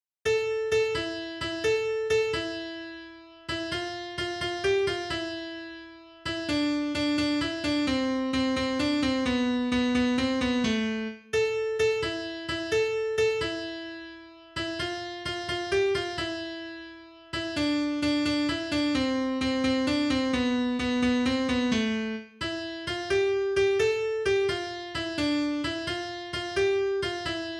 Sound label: Tarantella II, Italian Folkdance